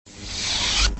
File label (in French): Eteignage Sifflant, sabre laser